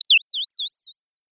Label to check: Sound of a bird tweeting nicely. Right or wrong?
Right